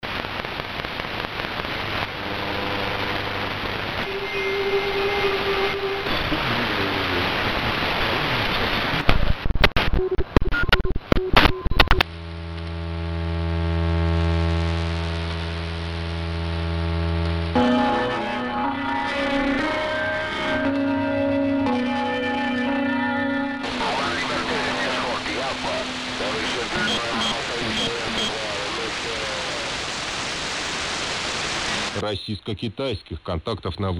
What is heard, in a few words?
vintage 4 band radio am